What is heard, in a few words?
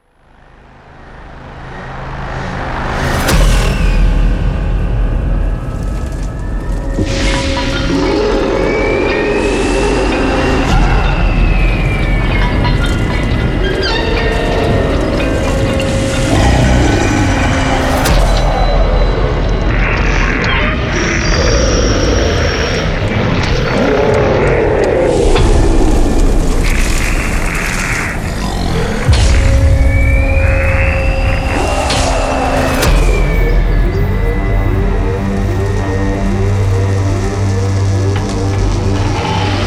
cinematic halloween mask sound fx; smokey loops